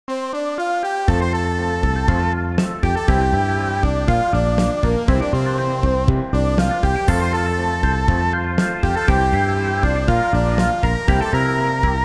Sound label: RPG town; City